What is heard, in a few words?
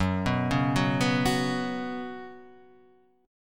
F sharp Suspended 4th, Guitar, Half Step Down